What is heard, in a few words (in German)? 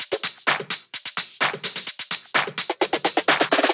Drum Loop 128BPM FULL ohne BD